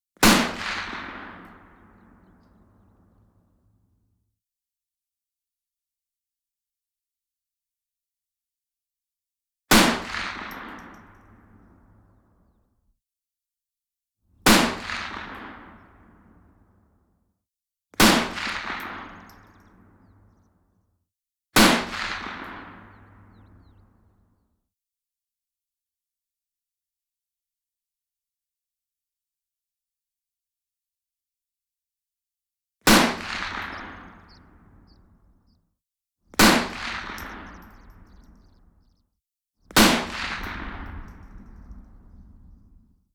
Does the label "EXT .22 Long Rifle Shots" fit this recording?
Yes